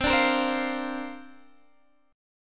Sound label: D-Minor 7th Sharp 5th C, chord